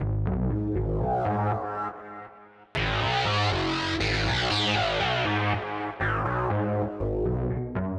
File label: bass left; emscripten; webaudio